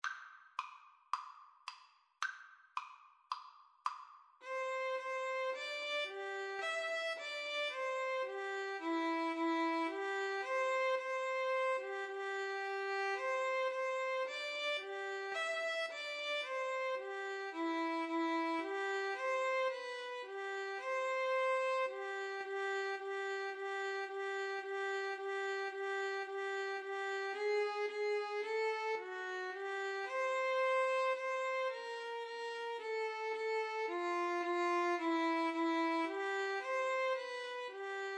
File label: violin flute duet; beethoven ode to joy